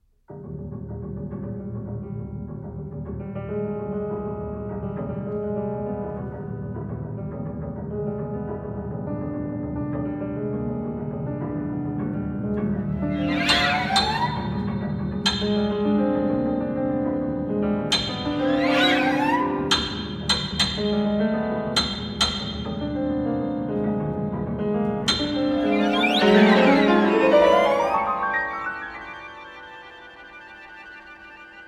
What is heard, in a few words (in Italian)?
per violino violoncello e pianoforte